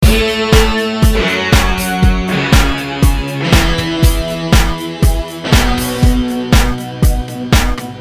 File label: depressing rock music